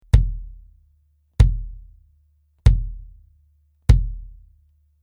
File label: BASS Reso Bury Beater; evans; naciag do centrali rezonujacy